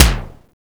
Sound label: SDS5 KICK F2; Greytsounds VOL-1; SDX KICKS